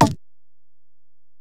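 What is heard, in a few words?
Acoustic Percussion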